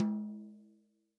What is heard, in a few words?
TOM, Real Drum Kit, POP KIT